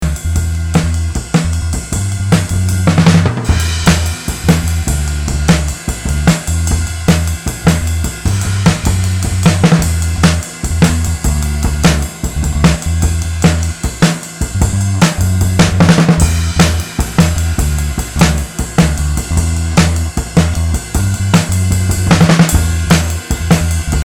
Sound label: Back Beat